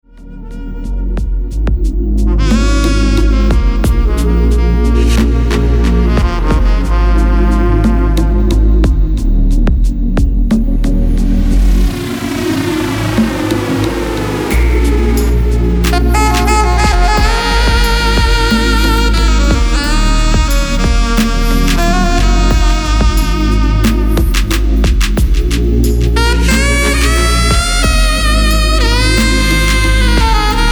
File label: Slow Jams G Sax